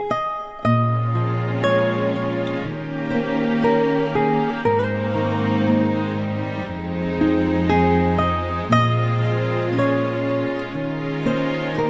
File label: Korea Instrument